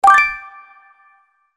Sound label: Percussive press; WeMod